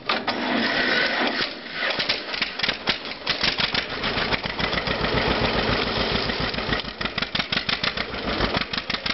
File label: Tractor, antique tractor